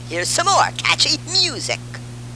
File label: catchy music